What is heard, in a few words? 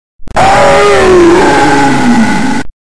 auw